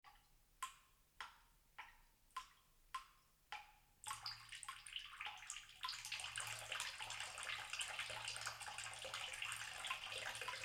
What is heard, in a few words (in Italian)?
Acqua